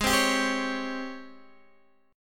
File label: G sharp Major 11th, Guitar, DADGAD; x,11,10,12,10,11